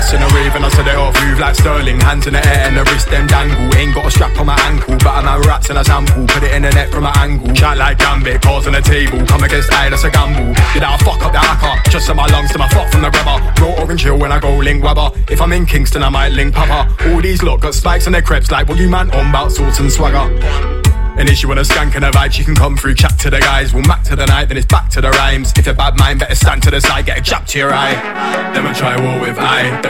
drum offside

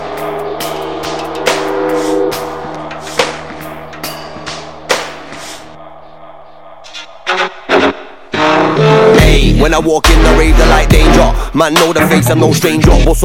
taiko stop

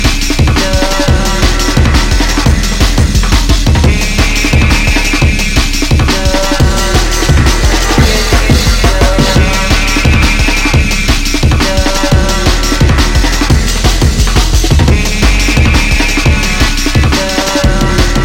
drum bad

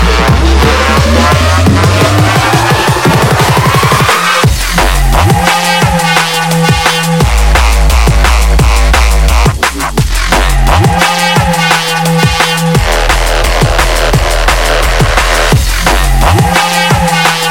drum

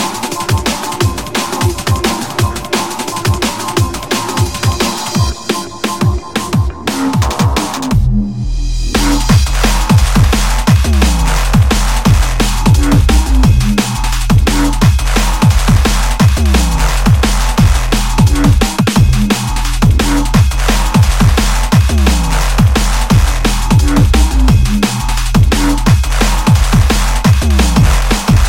bass

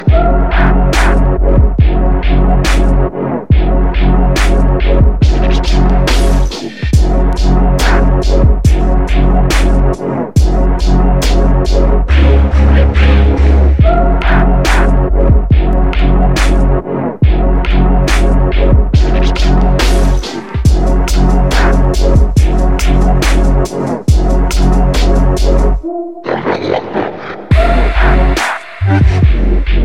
taiko

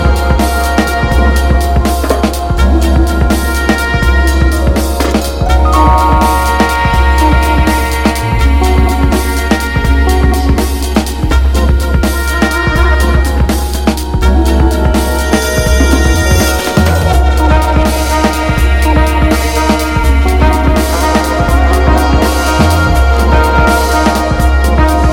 drum

